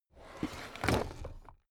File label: drawer cabinet stuff open regular